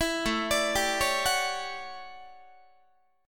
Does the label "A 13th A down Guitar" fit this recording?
Yes